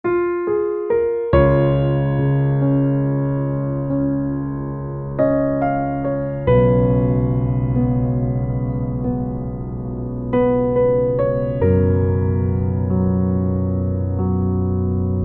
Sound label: Piano; phir se dhurandhar the revenge